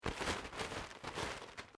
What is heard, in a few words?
PLASTIC SHEET SMOOTHED; Material components for constructing more complex sounds